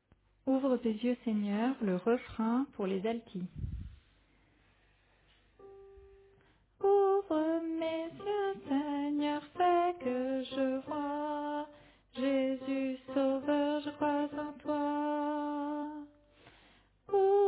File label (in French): Alto, chorale